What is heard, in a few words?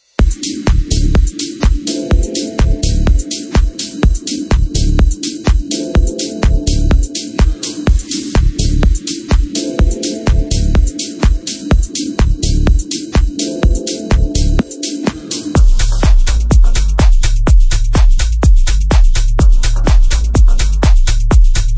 Deep Vibe; Smokey Loops